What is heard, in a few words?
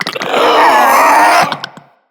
Infested Ancient Charge; Enemies; Puss Ancient